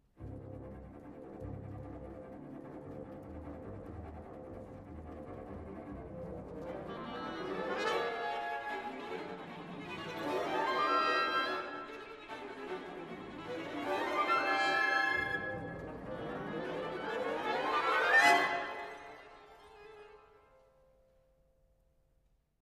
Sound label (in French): toccata orchestre; toccata2000